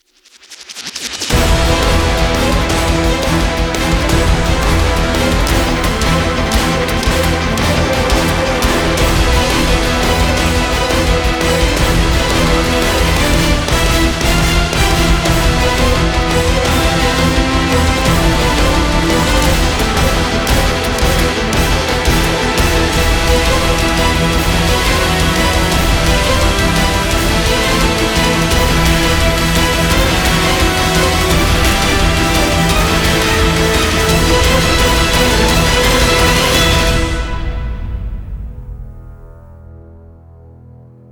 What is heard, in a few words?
No Choir, Epic Choral Action; трейлерная музыка